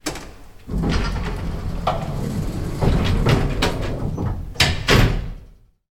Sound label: industrial machinery elevator door close; sound effects one